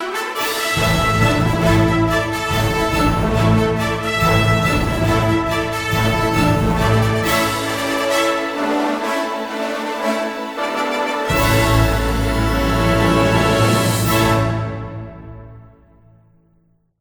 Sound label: Victory; superman returns; xbox 360